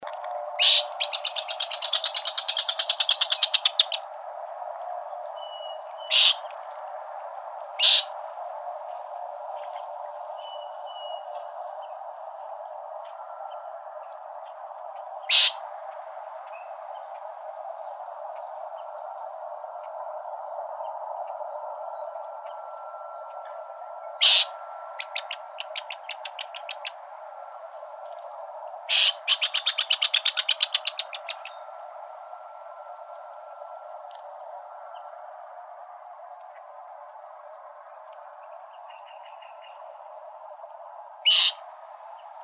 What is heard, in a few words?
Shorebirds; AZFO; deviche